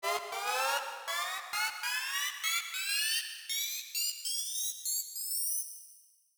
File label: step-stutter